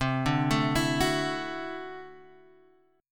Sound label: D-Major Add 9th C down Guitar Standard; arpeggiated; JGuitar